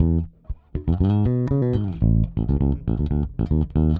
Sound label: Pop, Bass